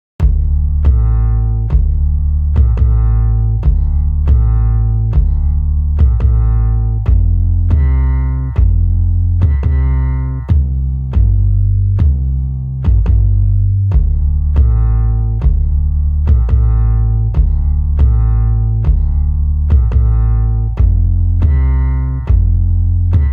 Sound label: Rhythm Village Layer; Cave Music; Mountain